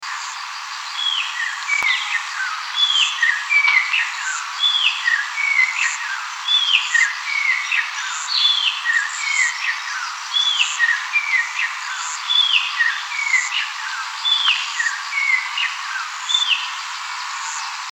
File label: red tailed palm thrush, Mbandaka